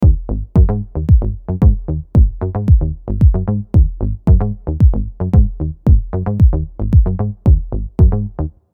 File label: Bass Sequence